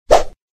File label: Swing; Weapons Throwing; android game music